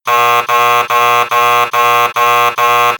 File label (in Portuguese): alertar